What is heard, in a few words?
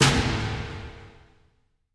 SFX Hit suspect; Interrogation; The Detail ios linux macos windows gamerip 2014